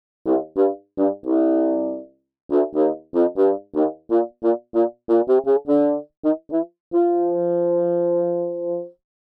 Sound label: basson